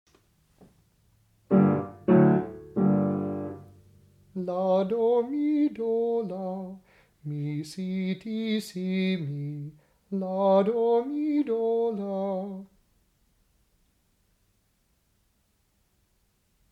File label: i-V-i Arpeggiated Progression